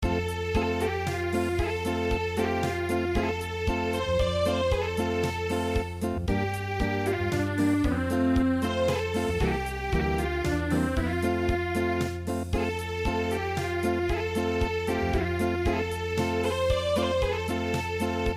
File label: Unplugged, midi